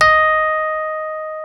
ROADZ D#4, Suitcase E.P; E-MU Producer Series Vol. 2 – More Studio Essentials; Keyboards

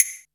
Boss DR-660 Perc, Drum Machines

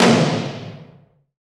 TOM, Dance

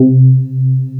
DEF C3, BASSES

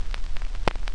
SP Record Noiz up; Digital Sound Factory

